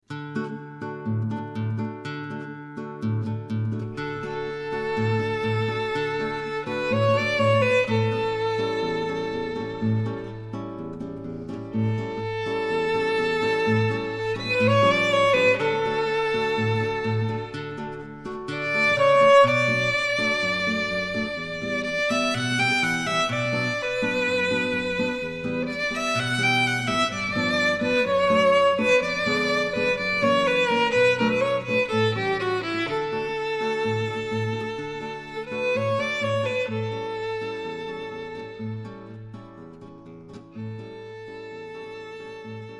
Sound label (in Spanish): guitarra y violin